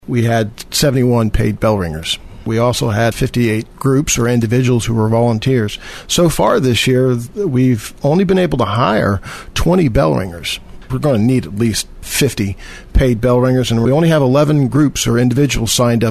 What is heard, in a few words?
Bell Ringers